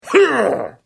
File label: Hit